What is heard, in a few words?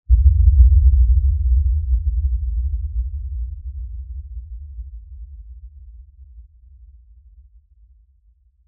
Lfe Rumble By Swell Low Bass Movement; Rumbles; Blastwave